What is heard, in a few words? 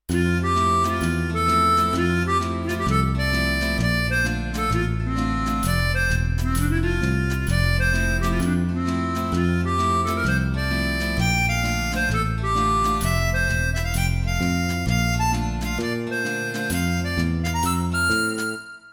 guitar waltz